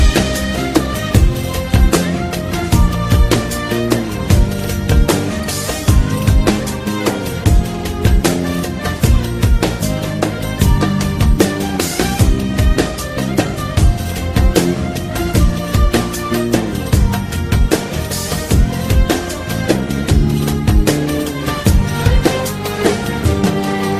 turkish ringtone

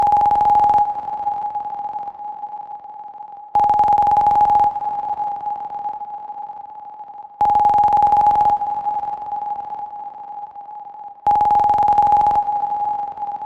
Ring clasic

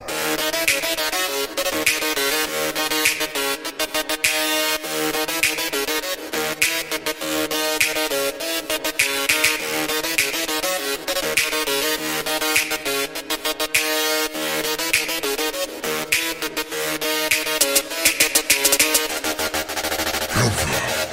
Arabic Bass

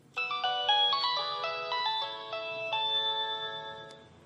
nokia polyphonic

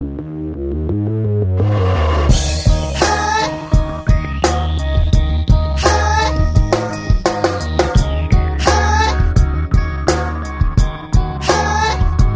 Electronic SMS

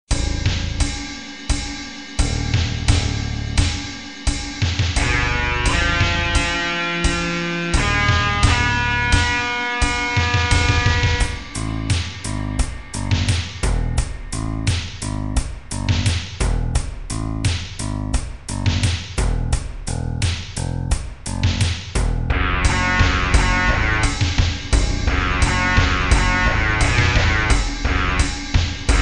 Ultimate Slow Tune

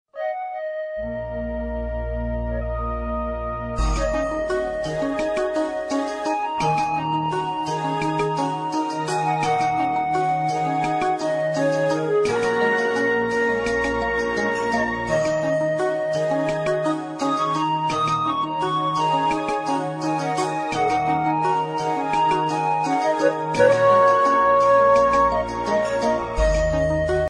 calm music turky

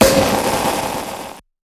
Shampagne open SMS

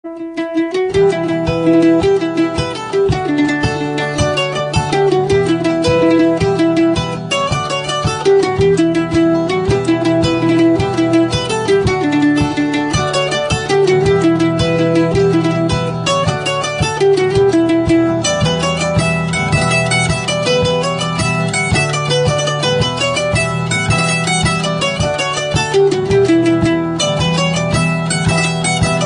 jig

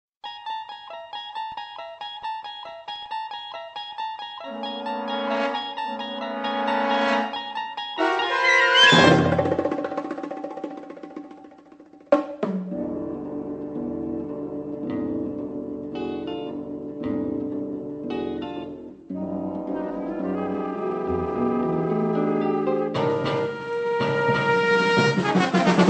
instrumenta

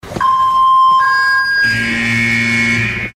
Fire Alarm

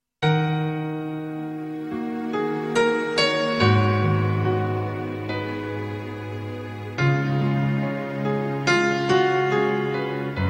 SOFT Tone Ever

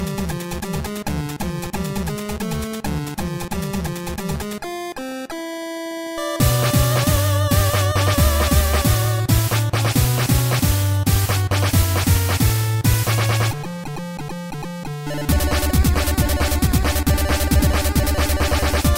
8 Bits; One punch

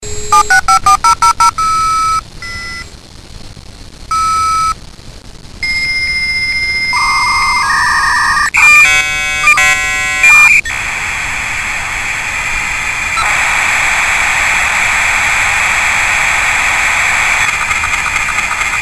modem; ringtones; sms

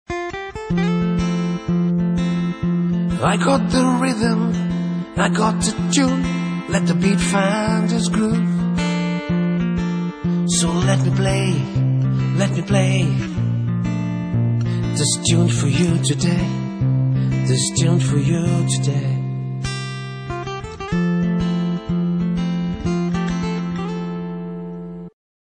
blackberry soft

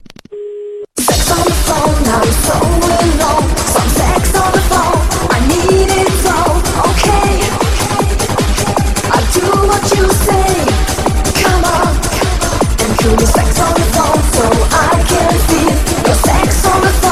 Phone

